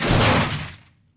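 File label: metal debris hit medium; objects; Star Wars Obi-Wan; XBOX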